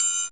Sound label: HH HH, Dance